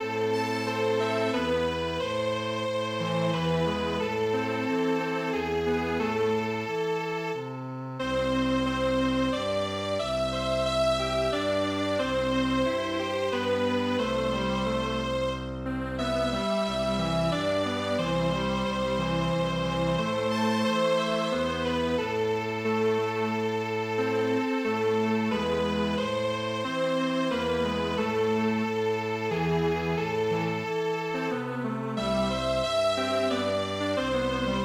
Strings Quartet

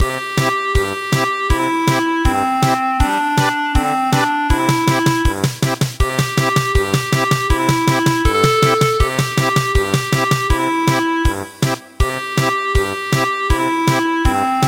German Polka; Chick

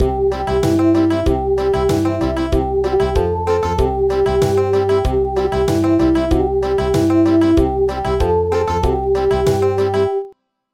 Country; traditional; Sleep my treasure

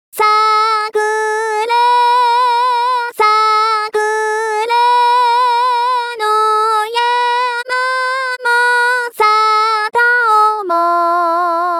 sakura vocal only, traditional

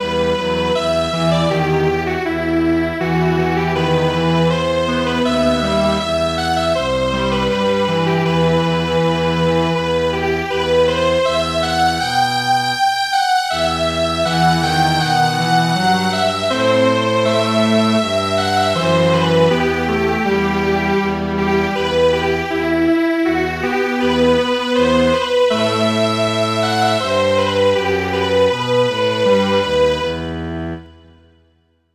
Strings Quartet; Danchone Bushi

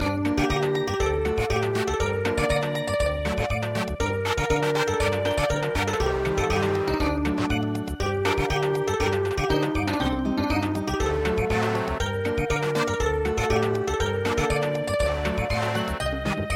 Samba

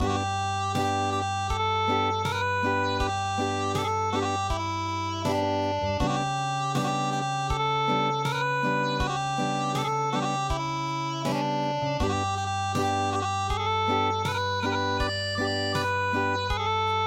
Acoustic; CHAWANMUSHINO UTA

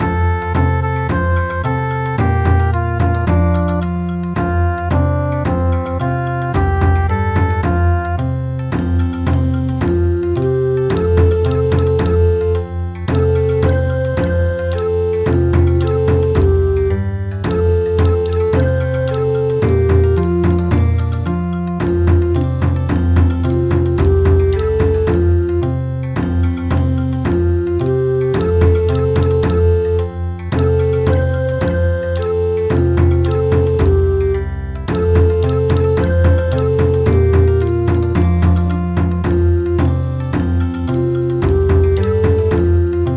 Bon Odori; Tanabata sama

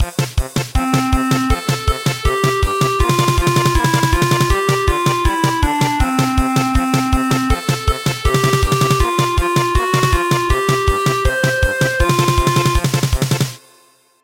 German Polka

